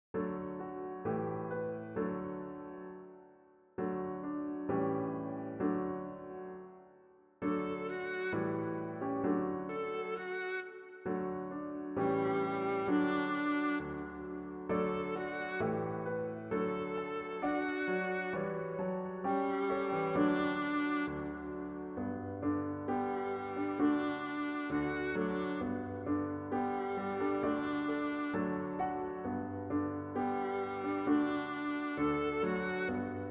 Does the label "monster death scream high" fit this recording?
No